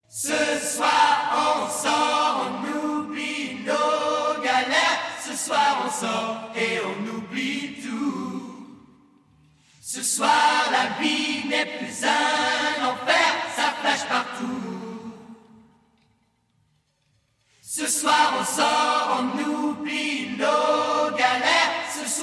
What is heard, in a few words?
Chorus Acapella